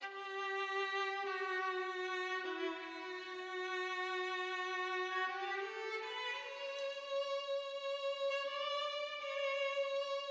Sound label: VIOLIN ONLY AUTOMATION OFF; Kontakt third party 3 demo song